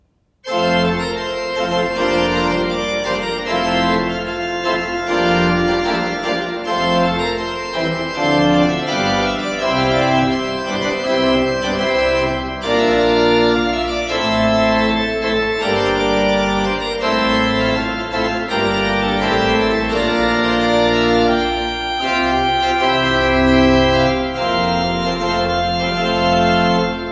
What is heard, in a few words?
Festive Trumpet Tune; kirchenmusik kaarst; zur hochzeit; German